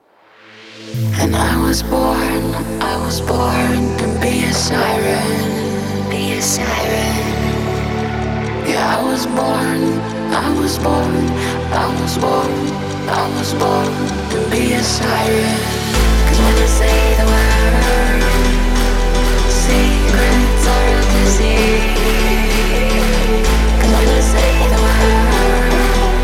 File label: Siren, Bass Boosted House Music